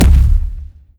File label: bass heavy, zombie blind step; Half Life Alyx; creatures